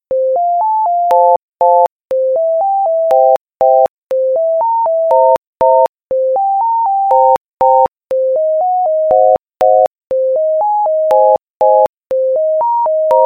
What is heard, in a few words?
harmonious chord